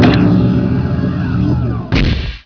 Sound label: MCV Deploy; Emperor - Battle for Dune